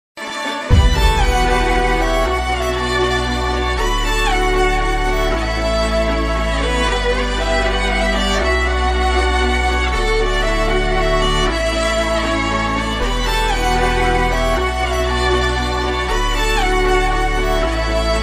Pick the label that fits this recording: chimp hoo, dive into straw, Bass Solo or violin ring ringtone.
violin ring ringtone